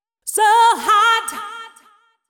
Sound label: deep house female, 125, A, vocals